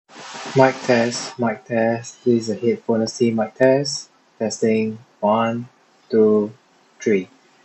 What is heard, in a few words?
KZ S2 Quiet